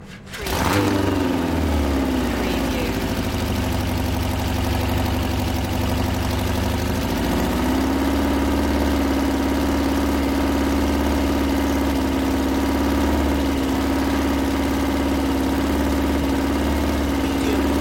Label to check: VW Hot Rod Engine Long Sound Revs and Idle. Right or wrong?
Right